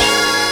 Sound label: stabs; stabs & hits